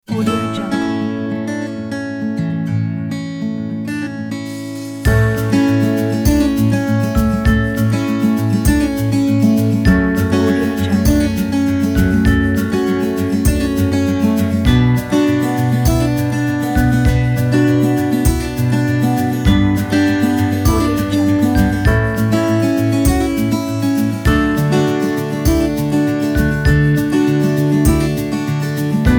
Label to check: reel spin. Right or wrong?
Wrong